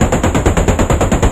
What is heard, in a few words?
machinegun mono loop; Weapon-Explosion